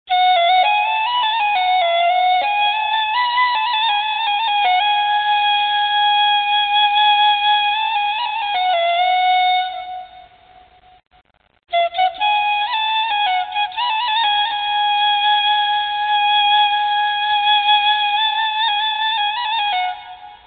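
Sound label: flute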